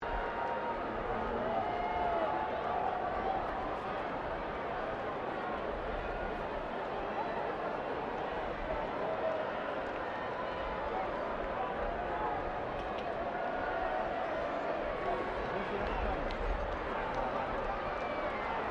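crowd 15K Rugby World Cup ambience low loop, Rugby world cup game; Crowd Sound Effects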